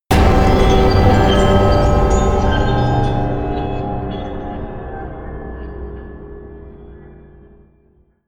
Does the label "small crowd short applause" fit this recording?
No